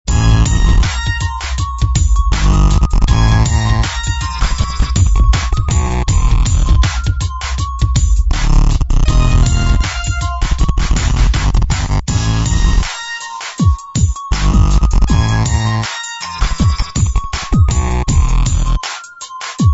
Funky Hop

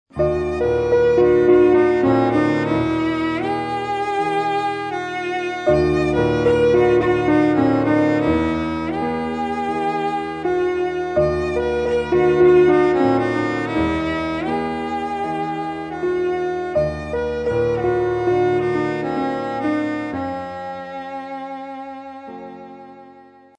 simple duet, music only

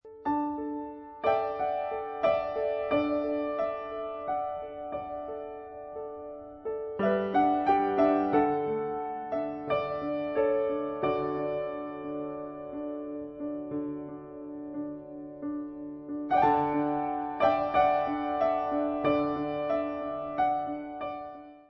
deck the halls piano; xmas

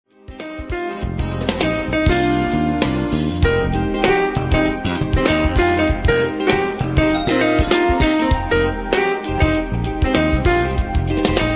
urban groove

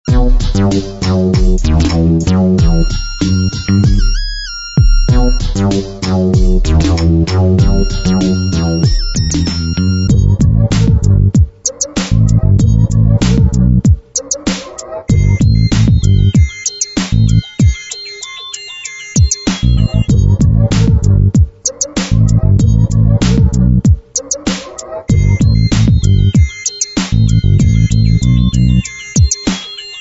Hip Trip

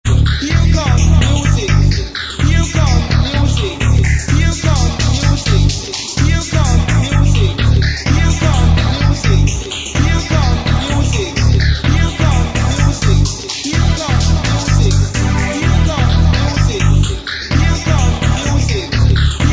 Major Housex

